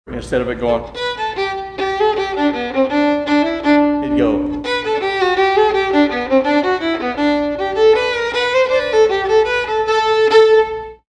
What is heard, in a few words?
fiddle EXAMPLE, texas style fiddling